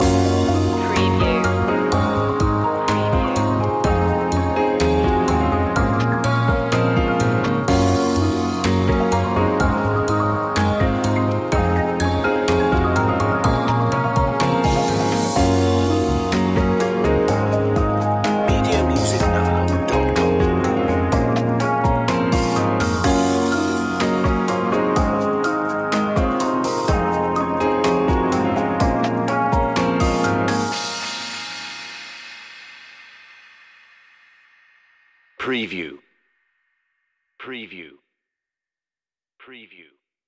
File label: thinking no guitar loop end; media music now